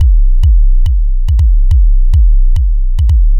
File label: Anabeat Kick; Sonic Foundry (Sony Creative Software); Prototechno